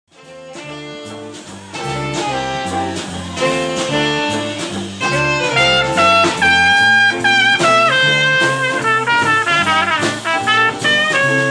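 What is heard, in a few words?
trumpet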